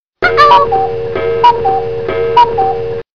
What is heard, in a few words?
CUCKOO CHIME ALARM RINGTONE; ICQ